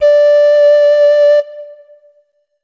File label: Instrument samples; peppa pig world adventures